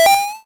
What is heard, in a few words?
Jump; puchicon; dsi ds